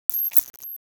Glitch

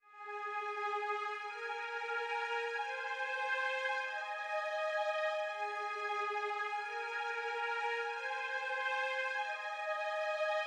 strings, 90 BPM G# Maj; Dreamland

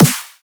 Snare - D#; Dubstep; Drums; One Shots; Snares; Cymatics; Dubstep Starter Pack

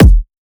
Kick; Heater; Cymatics; Future Bass Starter Pack; Drums - One Shots

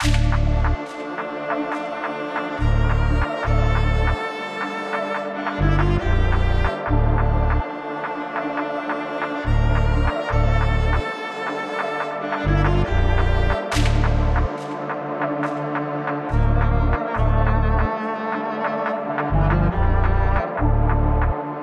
140 BPM D Min, Melodies Drill; Armed; Cymatics; GHOST (Beta Pack)

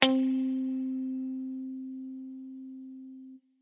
INSTR Spring (C); Melodic One Shots; Cymatics; ANALOG EVOLUTION Beta

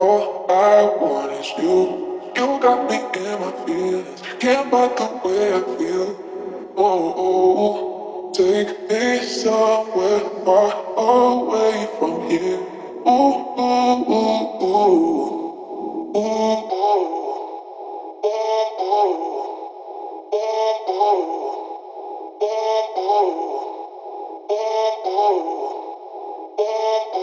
Vocal, 115 BPM C# Min; Infinity Melody Collection; Cymatics; Take Me Somewhere

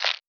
Percussion; Eternity; Cymatics; Lofi Starter Pack

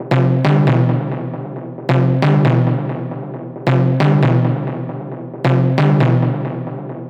Synth Loop F 135, Techno; Ghosthack; AC2024 - Day 1 - Techno Sounds 2025

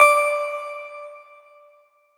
Riddim Synth Hit D; Synths; Ghosthack; Nitelite

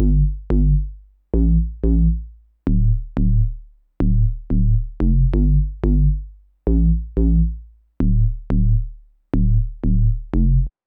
90 BPM D Min ARP2600 Bass; Synth; Loops; Loop Stems; ARP+JU60+P5; Cymatics; Fantasy Synth Sample Pack; Good Life

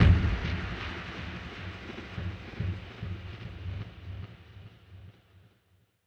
Wet Percussion; Mortar

